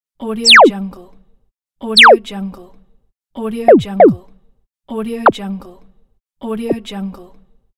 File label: blaster